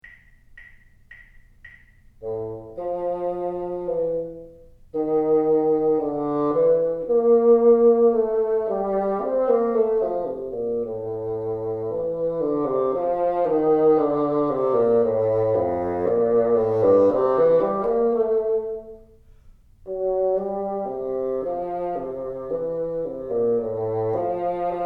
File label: teacher, bassoon